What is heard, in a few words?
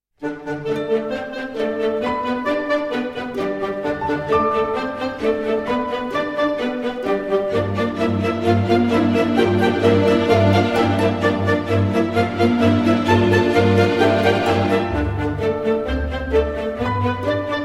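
nokia lumia classical music; ringtone; klassicheskaya muzika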